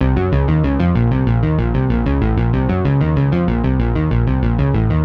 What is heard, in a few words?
Chase Bass 95-C, horrorscape samples 95bpm